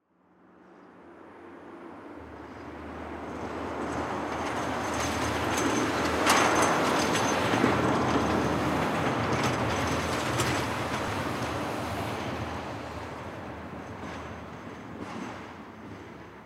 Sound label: Snow Plow Pass By, Snow Plow Pass By Rattle; Snowplows; Vehicles; Industry; Blastwave